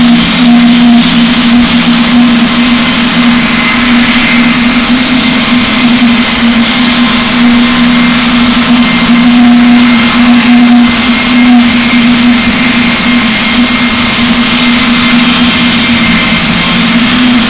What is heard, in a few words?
rorquals negatif, gaussian noise; Balaenoptera Physalus; SNR 6dB